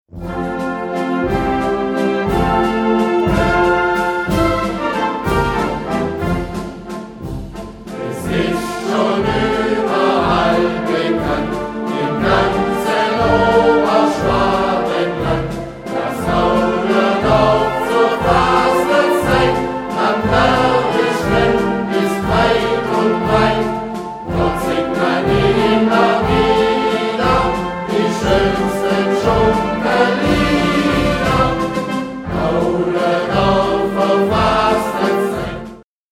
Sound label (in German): fasnetsschunkler gesungen